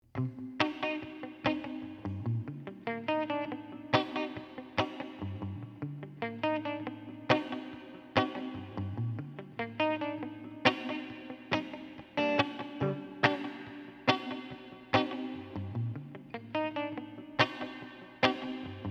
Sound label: left dry right room and wet emt 140 reggae